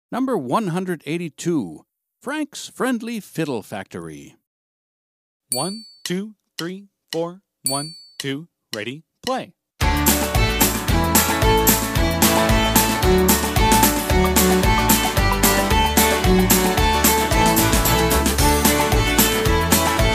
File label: franks friendly fiddle